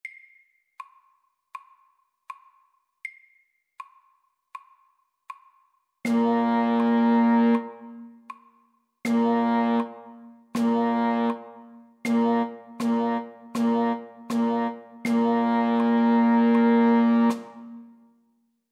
Rytme 4 slag i takten på slaget SAKTE

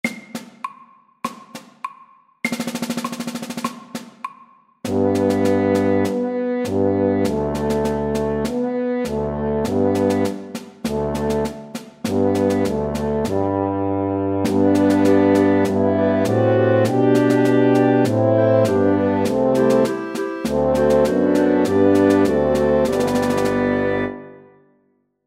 Kryssmarsj MIDDELS

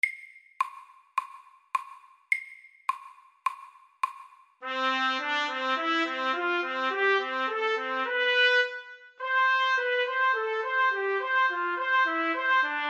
Intervall etyde MIDDELS

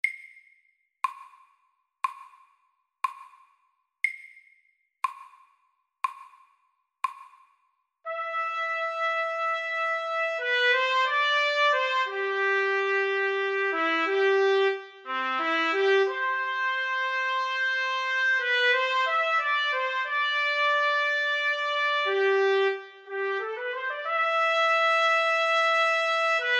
Etyde i D Dur MIDDELS

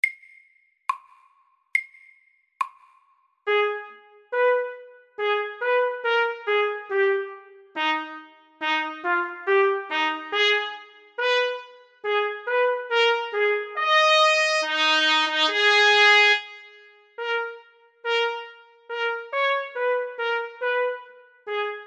Etyde i B moll SAKTE

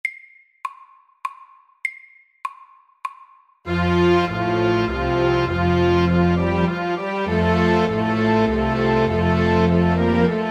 Menuett MIDDELS

